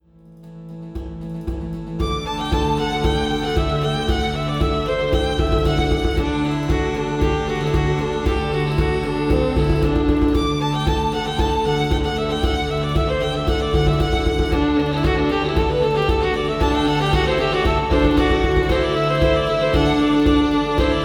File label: Celtic Pride